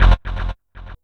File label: dance stab; E-MU Formula 4000 Series Vol. 2; Dance Hits